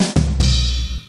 rimshot

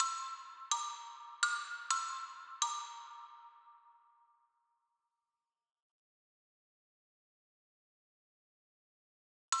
background mus

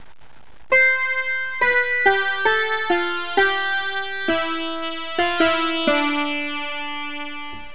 sitar

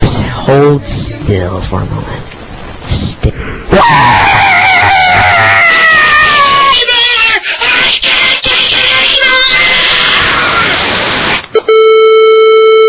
soldering

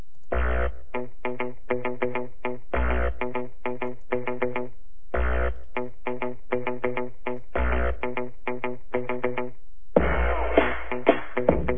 beat

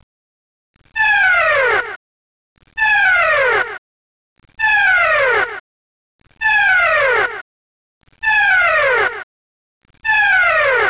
backwords red alert echo

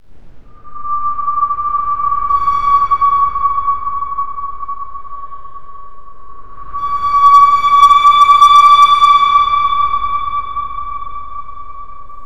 ghost, Creepy Sounds

